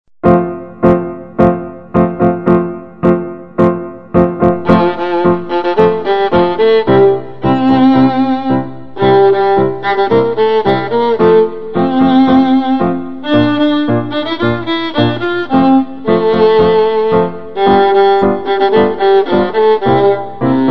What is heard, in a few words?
tango